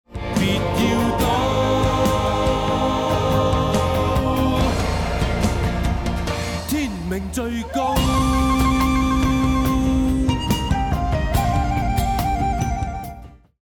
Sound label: choose team; music kits custom